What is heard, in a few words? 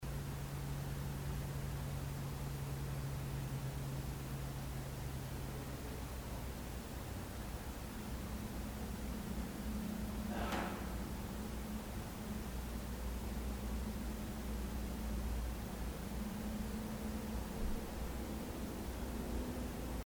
Unexplained breath knock; Case 023 Major Graham Mansion; Attic